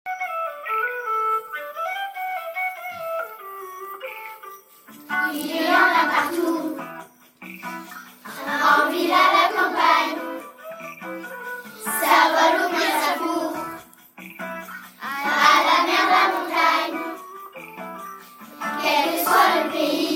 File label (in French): chanson insectes; Notre île sur le Gave, notre aire terrestre éducative, des classes de CE et CM à l'école Notre Dame